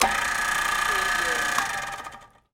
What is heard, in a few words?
Motor